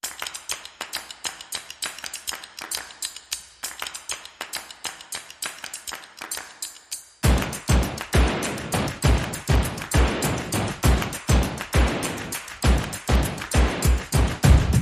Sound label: No Nylon Guitar